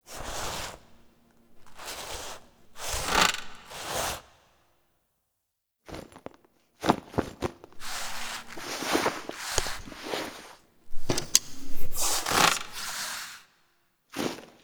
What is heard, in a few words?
Table For Two Foley; Cinematics; Zariman